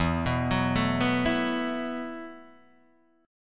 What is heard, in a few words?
E Suspended 2nd Suspended 4th E, Guitar, Open D; down